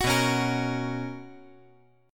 B Major 7th Suspended 2nd B

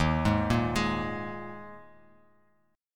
D sharp Suspended 4th D sharp, Bass Standard; arpeggiated; chord; JGuitar

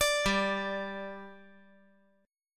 G 5th G, chord

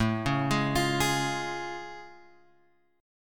A Augmented Major 7th A; A+M7 Chord {5 4 6 X 6 4} arpeggiated

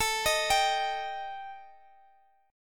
D sharp Major Flat 5th, A

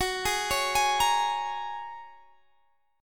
Bb Minor 7th Sharp 5th Gb

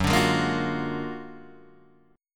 Gb Augmented Major 9th, down, Guitar, Open D

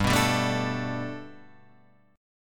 G Major Add 9th, Guitar, Standard; 3,2,5,2,3,5; Gadd9 strummed

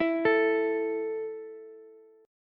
A 5th A down Ukulele, A5 Chord; arpeggiated

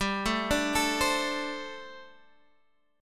G Suspended 2nd Suspended 4th G, Guitar, Drop D; chordshape; down

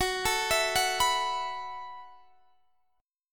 B 7th Suspended 4th Gb

